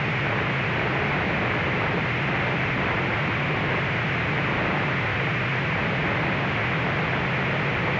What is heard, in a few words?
synth noise loop